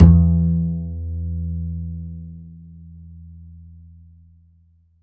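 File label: double bass E2; Univers Sons; Jazzistic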